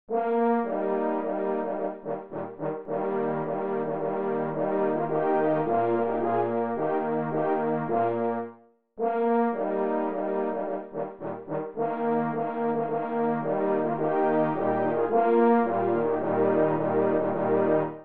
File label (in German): marsch; pillnacher